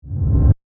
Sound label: Crescendo Ramp, Airy, Short; Crescendos; BlastWave